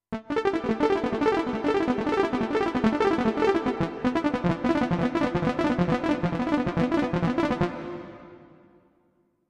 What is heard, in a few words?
Seq Analog